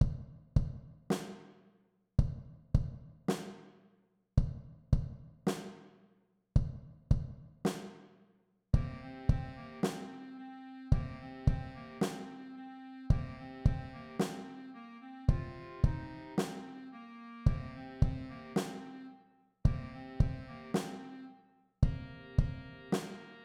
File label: perc